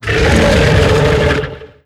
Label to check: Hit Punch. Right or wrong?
Wrong